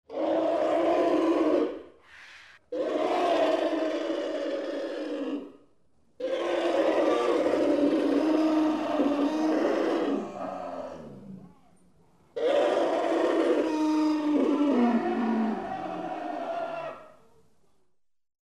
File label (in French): barrissement d elephant